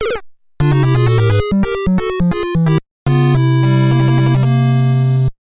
Lose Race, BGM; Super Mario Bros. Remastered

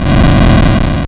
Burner; Super Mario Bros. Remastered

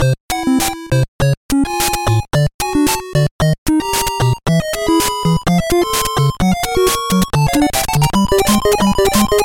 P Switch, BGM; Super Mario Bros. Remastered

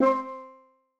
Steel

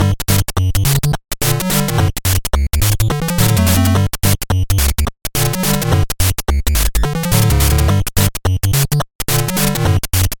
Pipe Land Hurry, BGM; Super Mario Bros. Remastered

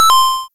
Correct; Super Mario Bros. Remastered

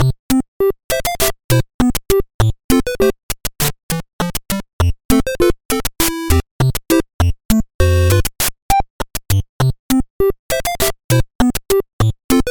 Achievements, BGM; Super Mario Bros. Remastered

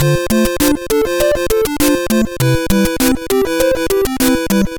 Wing, BGM; Super Mario Bros. Remastered

